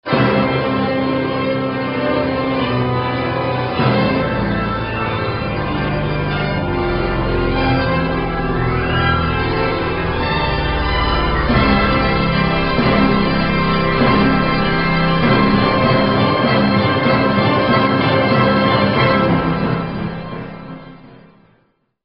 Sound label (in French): orgue orchestre; Final de la Symphonie n°3 de Camille SAINT-SAENS